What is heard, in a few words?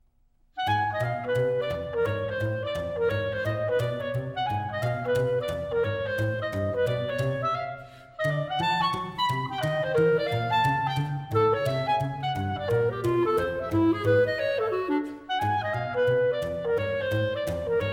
Clarinet and Double Bass - Brisk, with drive